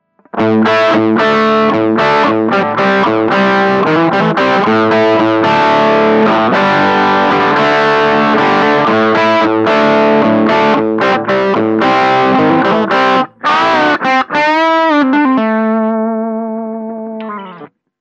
jcm 900; amps

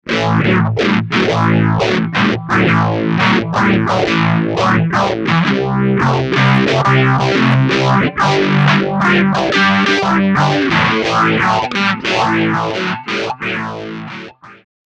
peavey phaser; amps

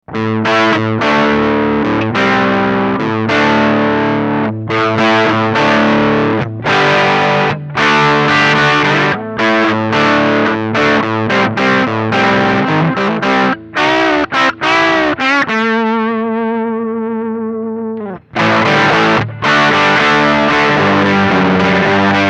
peavey normal, amps

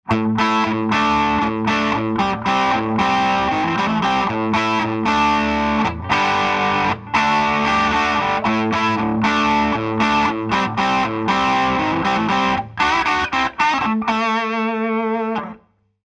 hiwatt c50 combo, amps

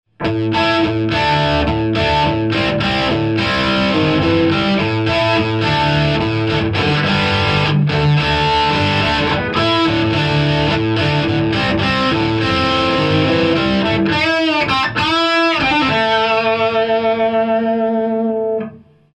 hughes kettner, amps

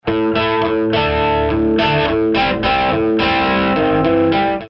hiwatt, amps

